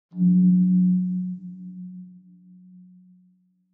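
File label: Alarm Air Lock Ambience Tone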